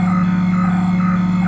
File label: Scifi Amb Control Loop; SFX Kit; Sci-Fi Loops